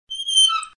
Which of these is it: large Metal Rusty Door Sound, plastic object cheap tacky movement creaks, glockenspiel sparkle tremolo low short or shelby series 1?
large Metal Rusty Door Sound